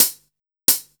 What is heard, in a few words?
DRMSND, Pure Electro, BEATKIT